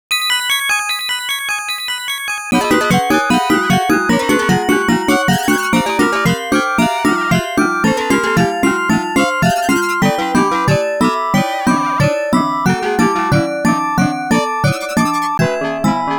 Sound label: Continue ~ Continue Failed ~ Continue Success